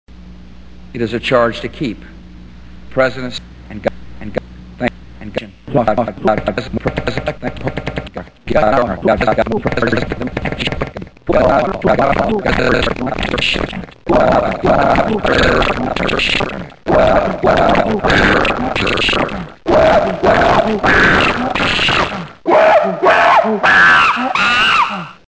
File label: bush chimp